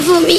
vomi

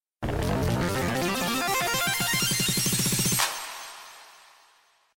rocket musique

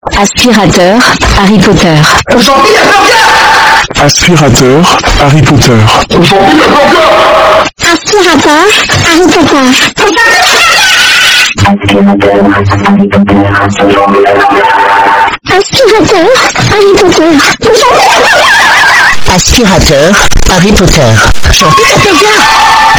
aspirateur; harry potter

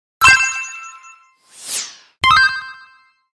alertes subs; kick

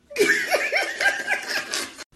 rire

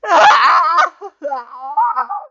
rire

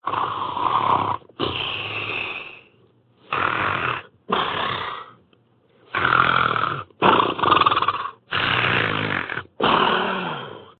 ronfle

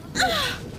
gemissement

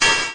coup

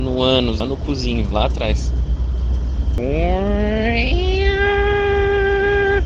cris

